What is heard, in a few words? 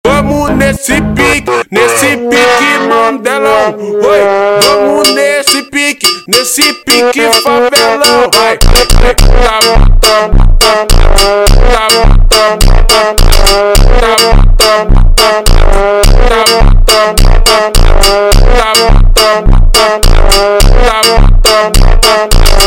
super Slowed